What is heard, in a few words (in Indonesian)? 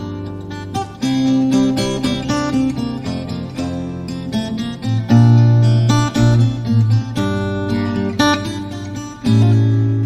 Akustik